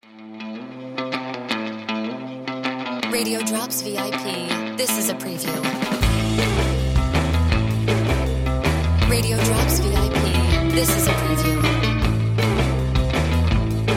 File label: Music Bed